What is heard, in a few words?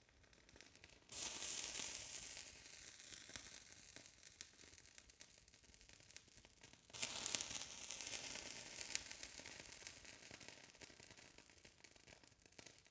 fire torch burn sizzle sequence